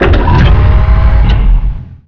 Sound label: Reverse Thrust